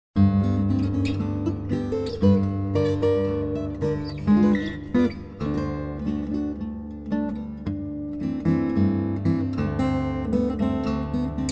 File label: rag, fingerstyle; hudson river rag